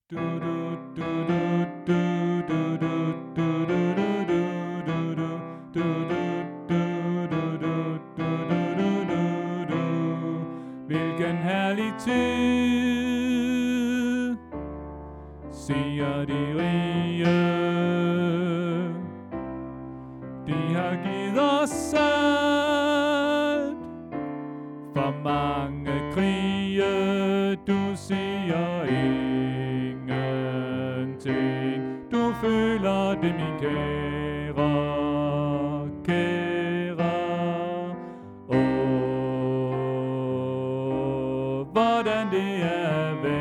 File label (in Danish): Bas